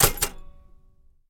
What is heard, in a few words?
Typewriter Shift Ke